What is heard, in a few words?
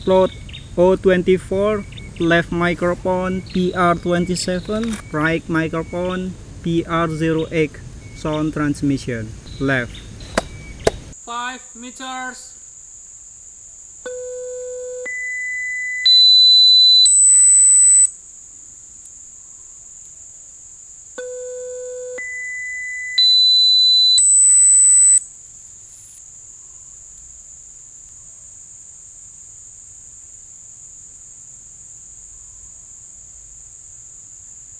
birds